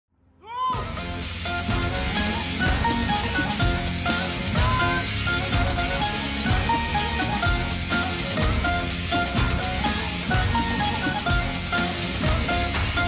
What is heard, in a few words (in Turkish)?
Zil Sesi; Populer Telefon Zil Muzikleri